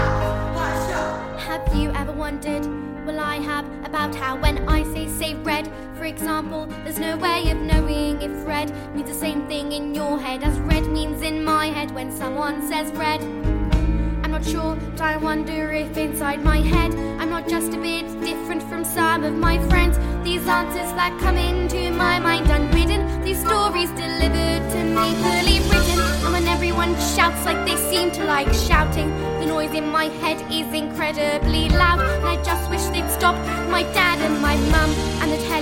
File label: quiet vocals